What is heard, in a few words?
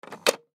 Baby Toy Chair; Baby Chair Pop Up Figure; Cartoon; Household; Miscellaneous Cartoon